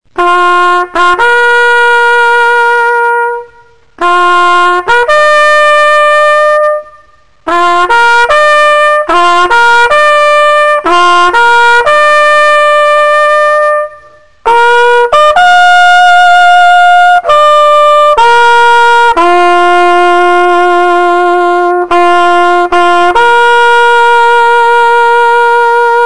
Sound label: TAPS, bugle calls